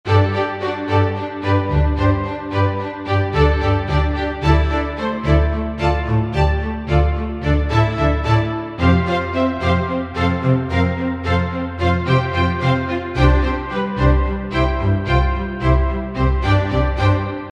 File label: Beat